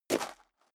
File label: step high heels gravel medium single left; schritte; Footsteps